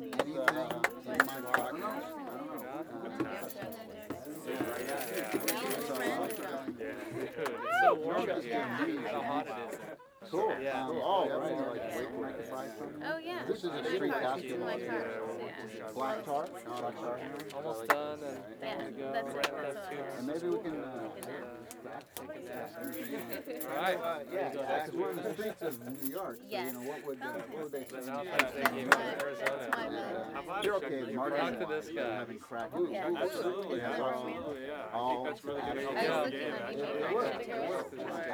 crowds; Street Hoops; XBOX

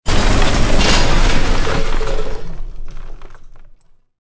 debris; Soldier Of Fortune II Double Helix; XBOX

